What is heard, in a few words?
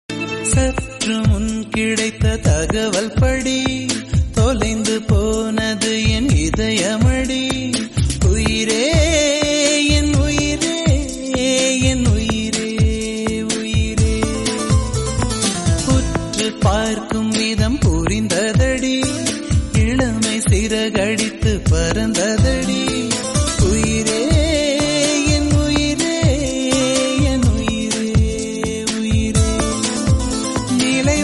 tamil song